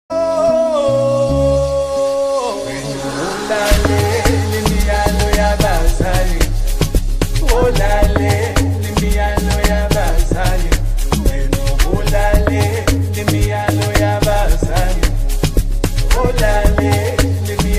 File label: Amapiano